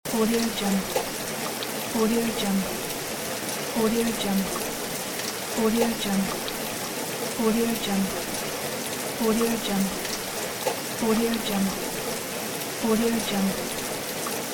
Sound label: rain